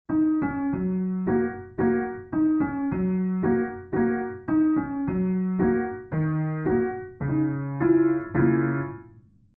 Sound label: Reason 10 Radical Piano Distant School piano